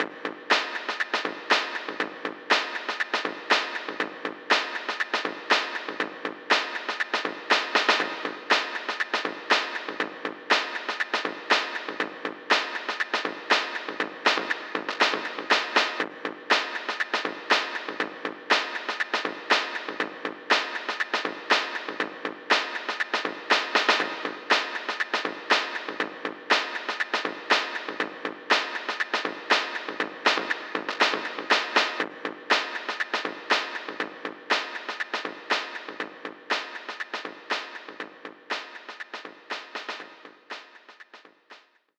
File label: Ambient; Inkopolis Plaza